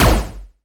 Laser Shoot

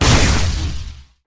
Plasma Shoot

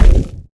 Hurt

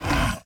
Hurt

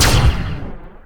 Prosthesis Shoot

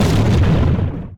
Nanogun Explosion

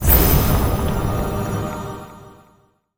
Holy Ray

